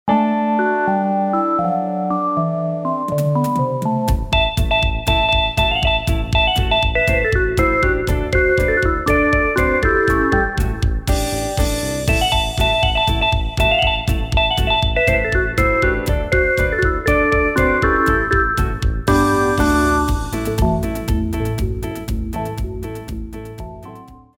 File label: e-piano; e-piano q2; agl vst customs